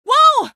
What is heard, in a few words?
explo whoa; episodic; episode 1; Source engine